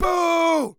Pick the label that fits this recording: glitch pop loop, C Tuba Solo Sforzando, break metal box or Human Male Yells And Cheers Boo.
Human Male Yells And Cheers Boo